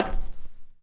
metal, Footsteps; zps; Foley

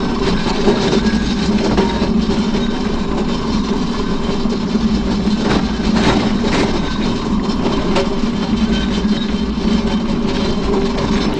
shredding pallet lifter; zps

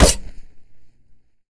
Machete Hit; zps; Weapons; Melee

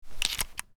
Super Shorty Load; zps; Weapons; Firearms

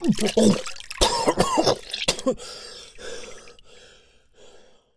Air Rough, Humans; zps; HM Water

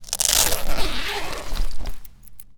Armor Full; zps

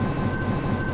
Huge Fan; zps; Ambient; Fans

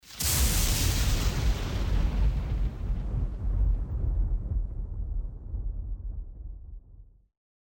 Thunder loud; zps; Ambient

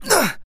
Melee Grunt, Human Male; zps; HumanMale-01; MeleeGrunts

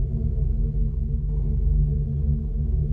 Hum; zps; Ambient; Hums

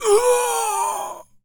Male Death, Zombies; zps; Male1

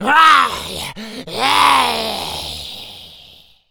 Male Berserk, Zombies; zps; Male1

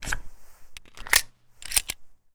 Glock Unload; zps; Weapons; Firearms

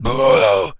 Attack, Zombies; zps; Z Carrier Speech

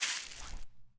grass, Footsteps; zps; Foley

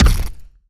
Hit, Zombies, Attack; zps

